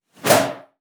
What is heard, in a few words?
BOW PERC, BASS FINGER; Xsample VOL-5